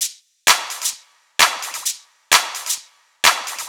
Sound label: Drum Loop 130, Downtown House; Drum Loops 130bpm